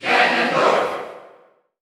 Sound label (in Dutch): Cheer Dutch; Ganondorf; SSBU